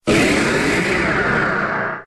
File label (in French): Cri Méga; XY; Cri de Méga-Alakazam dans {{Jeu XY}}; Catégorie:Cri de Méga-Alakazam